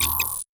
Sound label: Error Message; Custom UI Sounds; Call of Duty Advanced Warfare